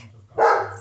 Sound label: Good boi bark